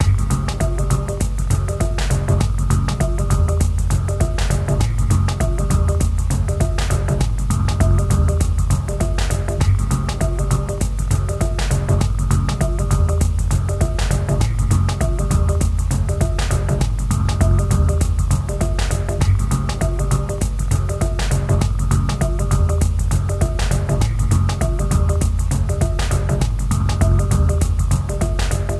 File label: forest